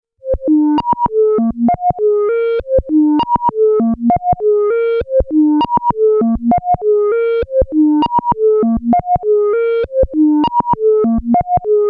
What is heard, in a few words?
Moog DFAM Patch Glitch Weirdo